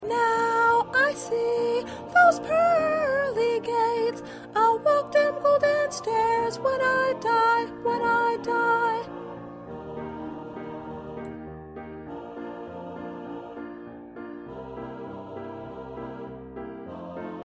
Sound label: Lead